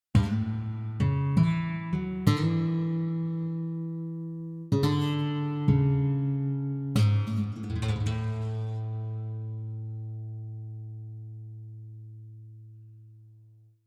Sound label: gitarro; Muzyka Ilustracyjna